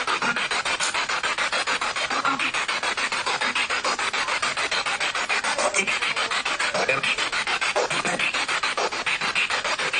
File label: Living Room Spirit Box; private residence freedom road apartment investigation